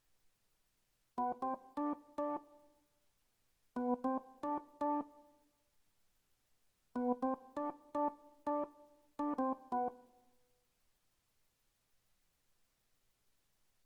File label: Piano R&B